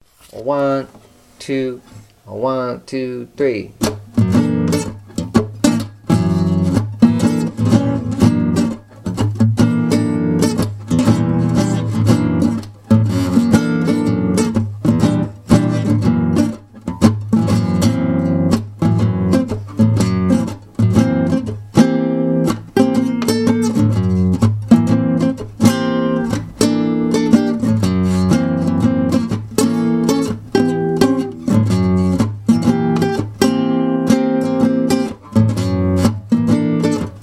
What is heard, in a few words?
melodic minor mode VII play along, guitar